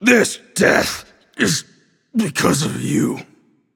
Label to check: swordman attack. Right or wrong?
Wrong